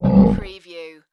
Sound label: Monster Grunt Short